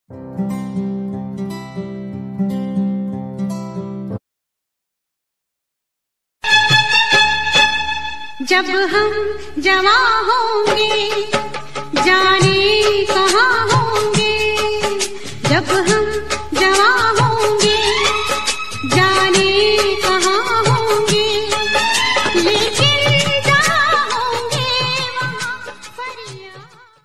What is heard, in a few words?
old hindi ringtone song hindi old romantic love ringtone; rajesh khanna